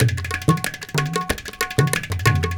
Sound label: India, Heart of Asia